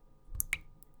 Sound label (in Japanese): 水滴